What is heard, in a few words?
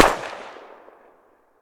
ak far; superb warfare